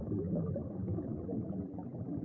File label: underwater